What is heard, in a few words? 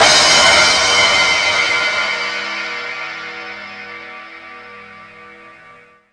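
cymbal, drums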